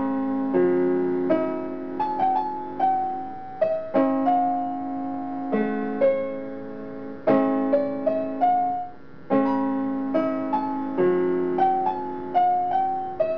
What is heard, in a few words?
piano